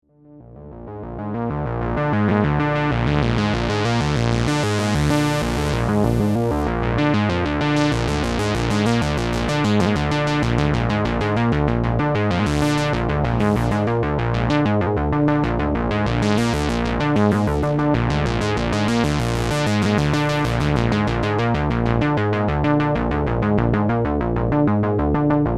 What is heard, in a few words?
your basic lowpass